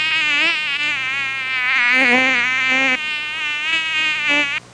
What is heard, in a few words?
Mosquito sound